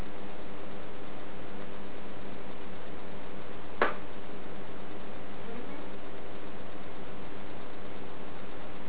heard something fall in empty living room and then childs voice